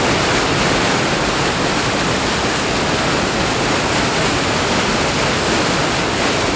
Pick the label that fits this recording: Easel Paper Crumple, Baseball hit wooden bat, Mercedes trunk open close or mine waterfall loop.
mine waterfall loop